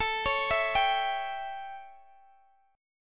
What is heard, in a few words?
A 7th Guitar Open G, chord